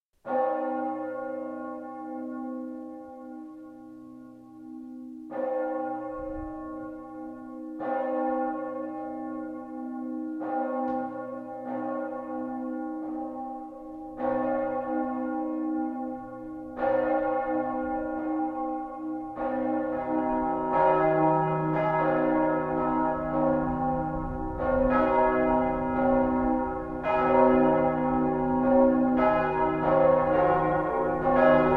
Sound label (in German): Plenum 7 Glocken